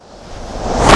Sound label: Fireball Unleash